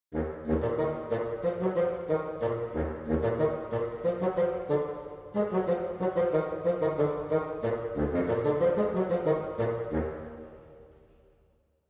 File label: Dramedy Sting Woodwind